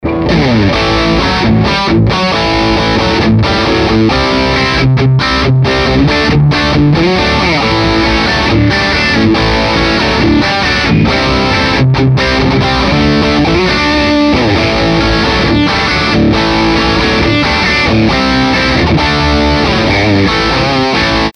Plexi Tone 80s Hard Rock Rhythm; Carl Martin

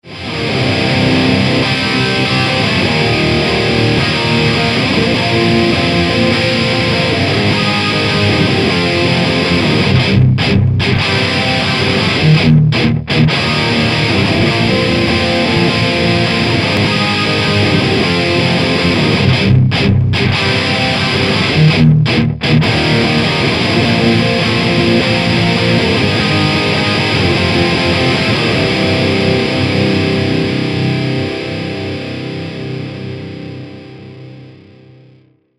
Stereo High Gain; REV100HD

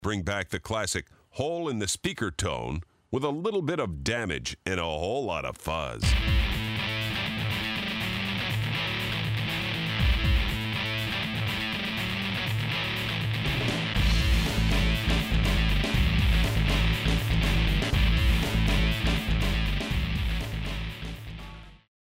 Heavy Fuzz

